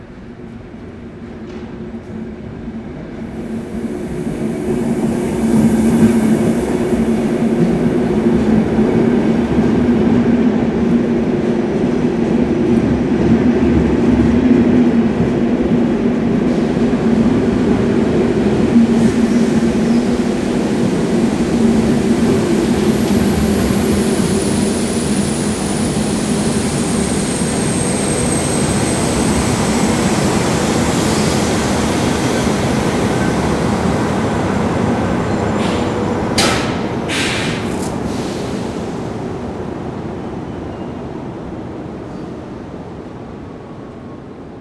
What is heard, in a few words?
train rolls in stops; best service Blue Box